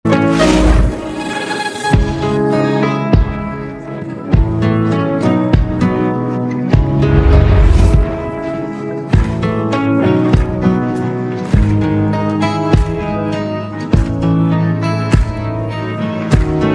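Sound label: cover karaoke backing track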